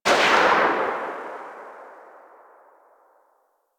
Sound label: Fire Tail, Weapons; Warframe; Braton